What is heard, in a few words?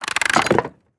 Material Wood Lever; Game Essentials